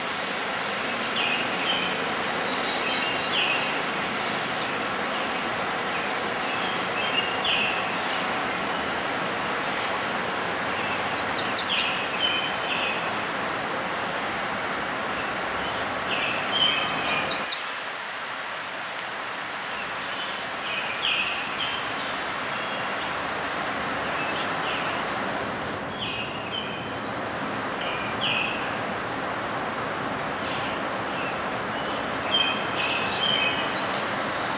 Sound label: Black-throated Blue Warbler